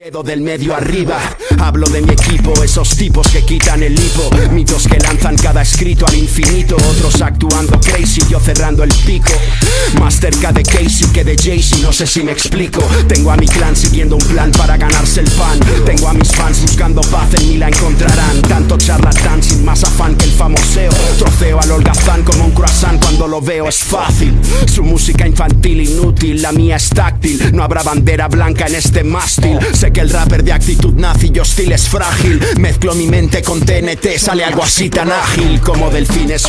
Rap; Reggaeton

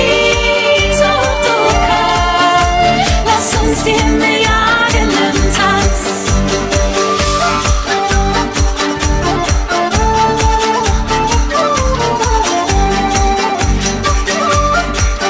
Classical Ringtone; MUSICA CLASICA

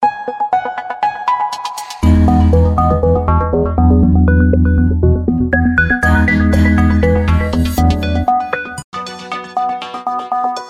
Samsung Note 9 theme Ringtone

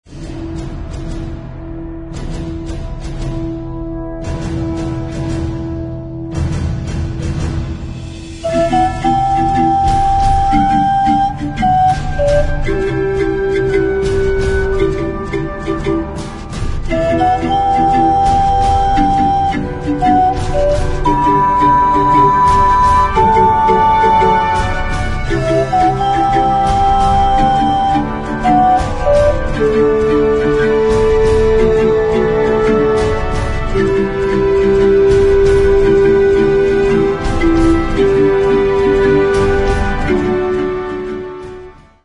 bottle; terminator

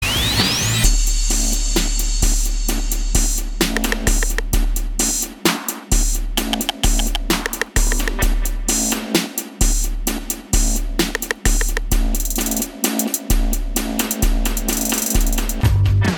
Samsung S8 Bronx Ringtone; tonos para teléfonos móviles SAMSUNG

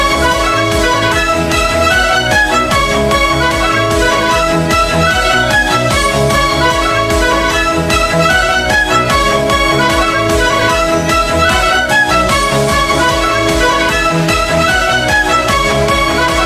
Classical Ringtone; MUSICA CLASICA

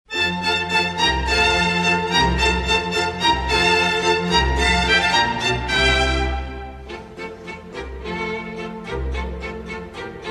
Best classical Ringtone; Antonio Autumn

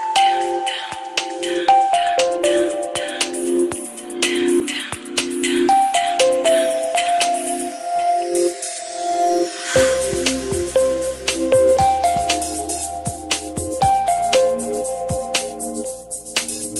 Samsung tone Ringtone

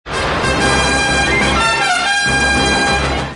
sms; superman

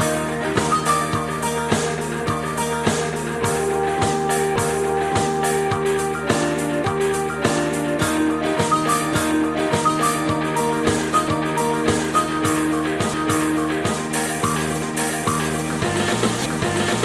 Samsung S10 Original Ringtone

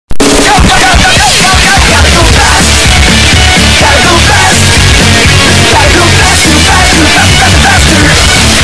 Sonic Song

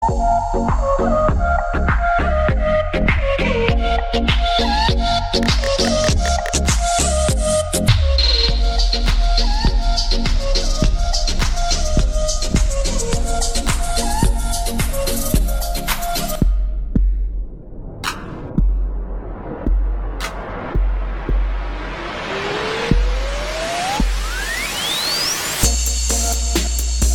ringtone; Samsung S9 plus

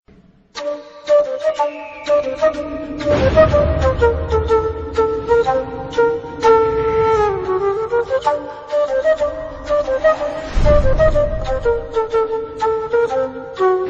Classical flute Ringtone

